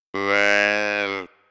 Deer Vocal; Animals; XBOX; BassProShopsTrophyHunter2007

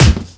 body impact metal

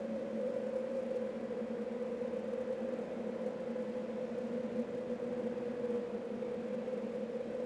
wind canyon ambience; Star Wars Obi Wan; XBOX

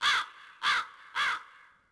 crow; Ambient; MX vs ATV Unleashed

